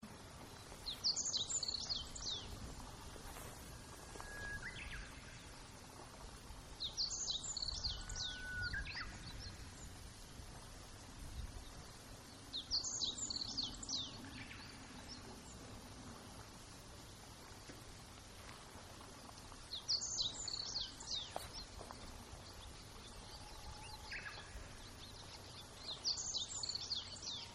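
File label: bird, Meadow bunting; hoojiro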